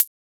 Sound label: Closed Hat